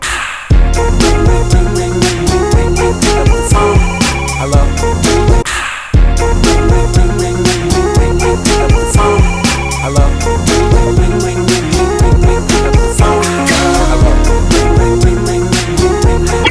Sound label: ringtone